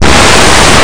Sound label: freeze